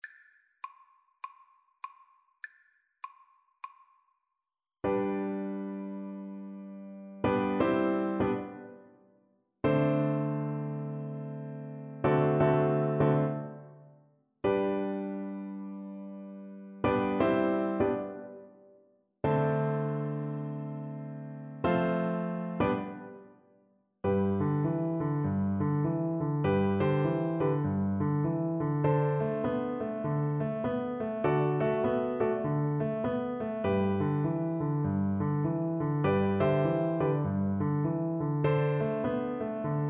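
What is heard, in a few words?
recorder karaoke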